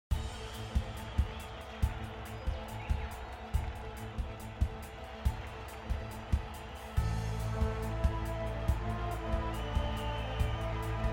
base Game Sound; rugby Star Deluxe

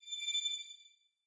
meter change; Skillzgaming; battle Mania Desktop

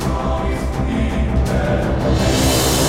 Queen Bonus Loop Intro; genesis; clash Of Queens

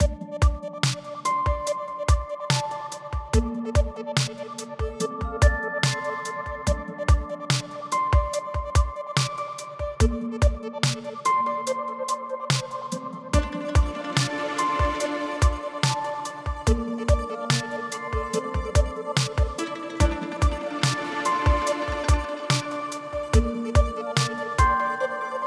Base Music Loop; Romanov Riches

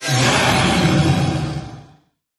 reel suspense win; Dragons Myth

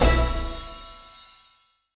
fairy animation end; PushGaming; Peter And The Lost Boys; peter Pan

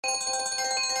Counter Big Win Loop; fire Wizard; Axial

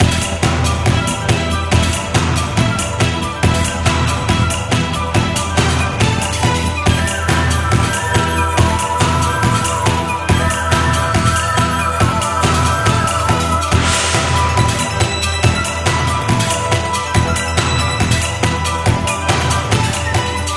Bonus ReSpin Music Loop; Long Mu Fortunes

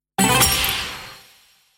block break; medallion Desktop; Fantasma

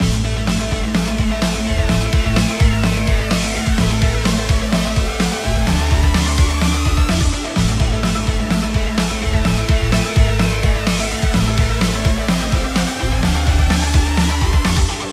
Big Win Music Tally Loop; Romanov Riches

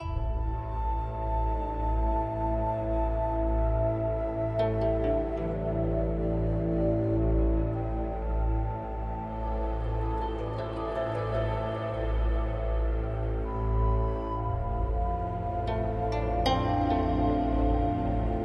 Base Music Loop; 108 heroes Multiplier Fortunes; Mahi Gaming

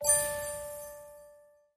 new multiplier; Skillzgaming; battle Mania Desktop

